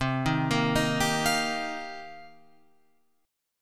C-Minor 11th-C-12,10,10,10,10,12, down, Guitar, Open F, Cm11 chord; arpeggiated; JGuitar